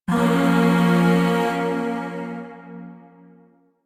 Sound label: Shawzin Hirajoshi Chord C; Chords; Warframe; Zariman; Void